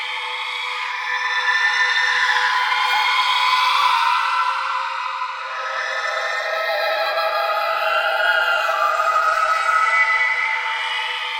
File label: Vocal Predeath Loop; Dialog; Warframe; Zariman; Void Angel